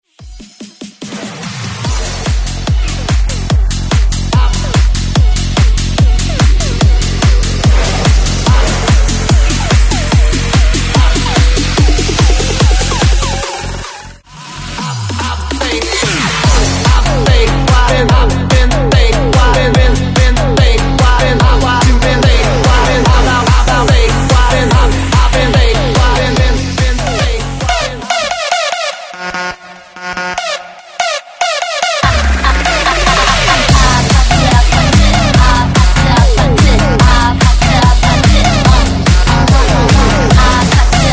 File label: Bounce Mashup 145Bpm; DJDOLLS